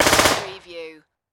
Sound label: Submachine Gun FN P9; Sound Effects Bible